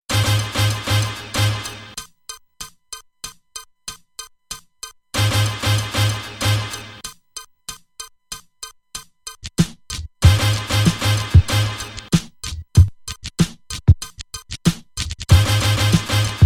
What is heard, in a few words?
beat